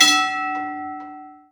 Overpass Train bell

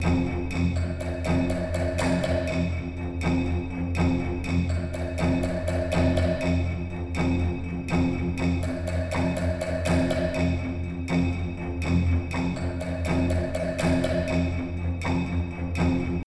String; The Bureau XCOM Declassified

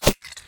yellow ball appear

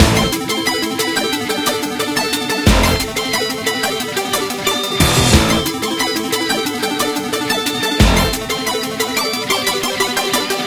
Intro Bpm180; Gekimaden Intro Dunk.Ver; Ninja JaJaMaru Collection; ps4

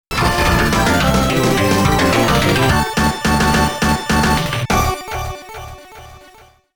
All Clear; Mega Drive 25th Anniversary Album Vol.1

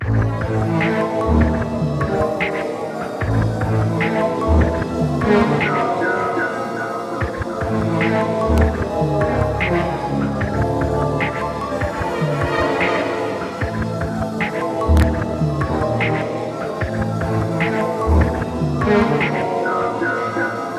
Cave, Dark; Pikmin 3 Deluxe; Vehemoth Phosbat Cave - 1-2 Bulbs, Phosbat Near

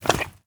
Footsteps; DW SwampJumpers

